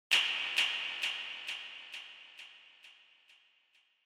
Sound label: Finger Snap Foley Delay